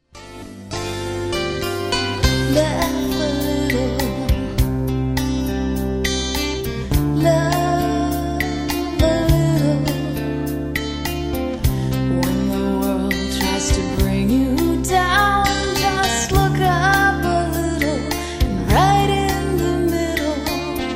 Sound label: laugh